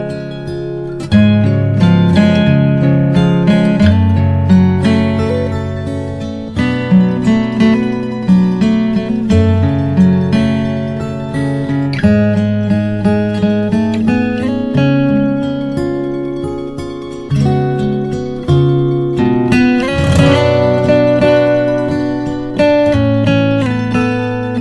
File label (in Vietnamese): nhac phim